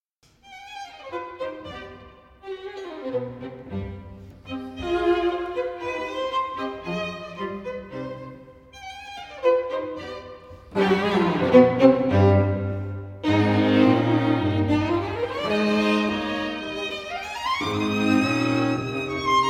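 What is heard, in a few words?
Beethoven trio 4th movement; Beethoven - String trio in C minor; Festival Montalcino 2012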